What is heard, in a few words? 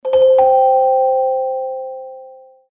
Look Calendar, Celesta; HTC